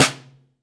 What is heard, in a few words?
Prepared Drums